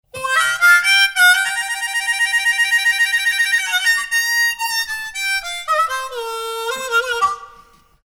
120 C Harmonica

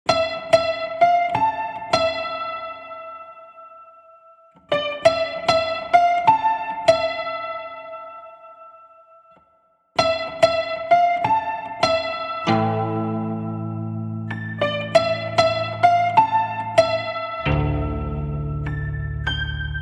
97 Am Piano

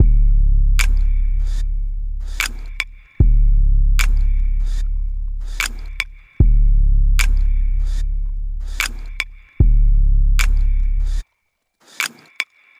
Drum Loop 150bpm

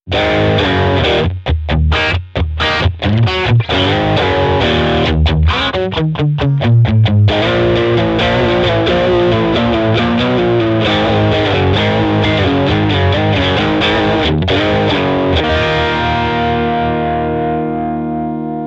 Blues OD - Rhythm FX ON

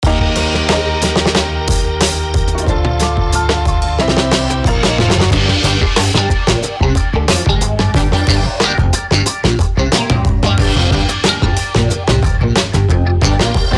70s Funk Track

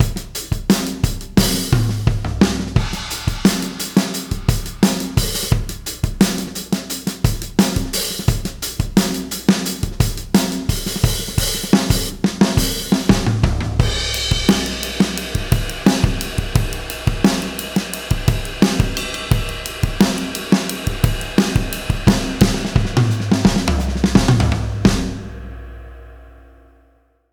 Basic Gretsch; 60s

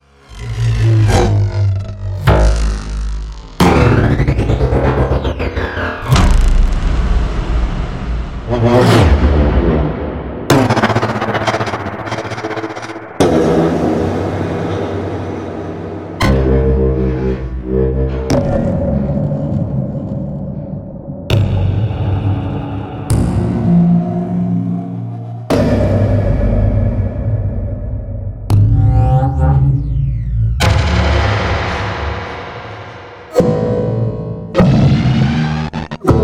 Metallic Impacts; Deflect

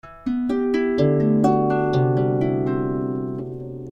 124 Em Harp

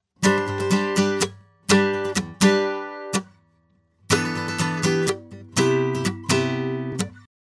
124 G Acoustic

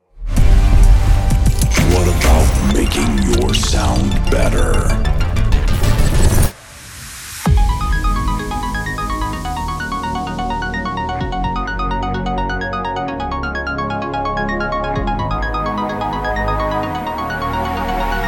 Future House; Babylon Expansion

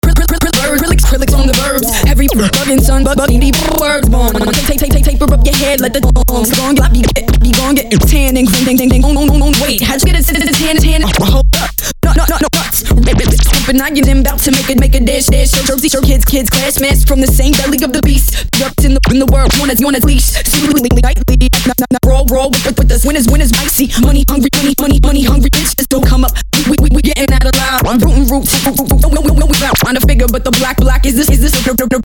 girl rap

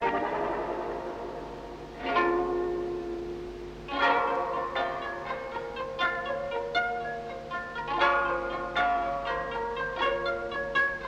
Cassette Plucks; Kontakt 5

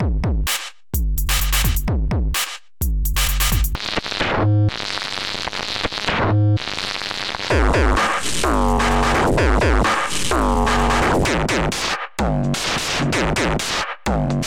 Extreme Settings (Warning Heavy); Kombinat

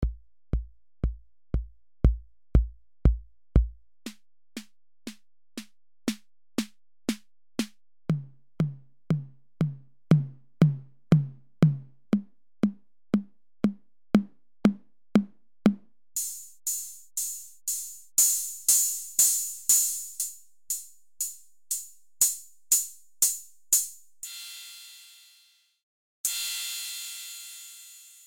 Dry Instruments

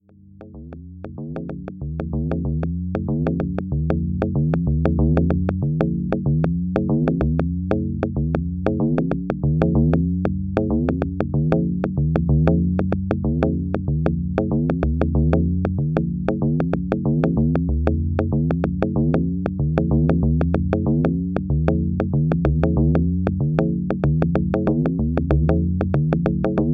Polyrhythms

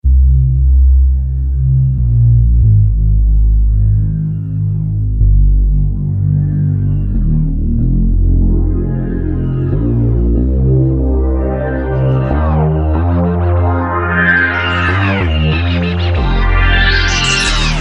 Instrumental

